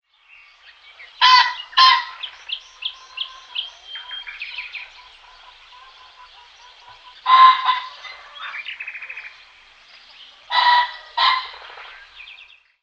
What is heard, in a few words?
Ring-necked Pheasant male display